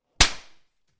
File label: hit with metal tray